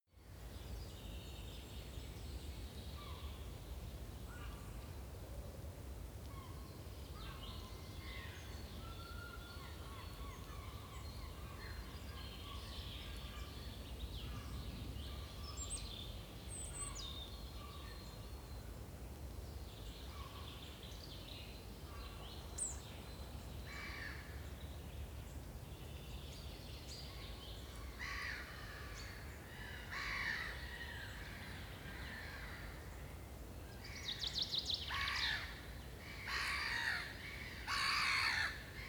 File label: park bird variety